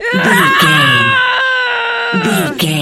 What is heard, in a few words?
voice female battle shout